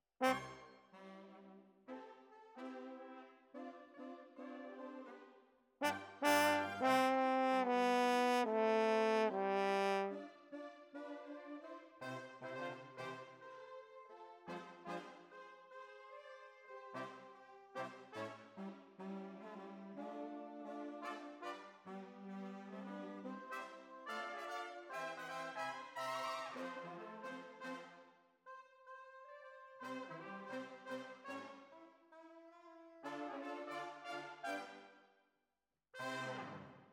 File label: Horns